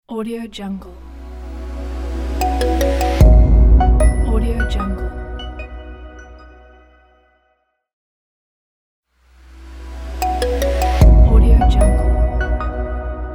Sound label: modern pluck logo